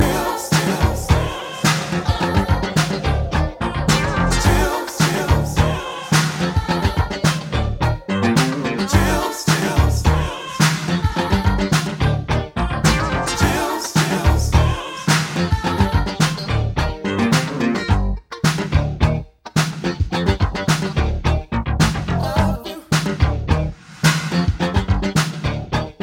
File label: Instrumental